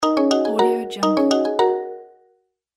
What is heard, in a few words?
sound effect ringtone